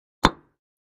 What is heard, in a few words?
Patio Block Impact, concrete & brick